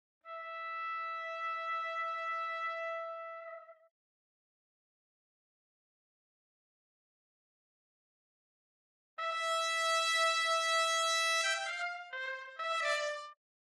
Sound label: Trumpet; Holst Jupiter